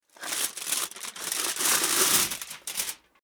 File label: Foley Tin Foil Movement Sheet Handle; Kitchen; Household